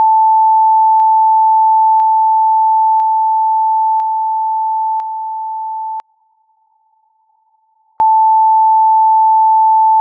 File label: stereo phase